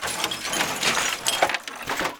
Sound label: nails screws foley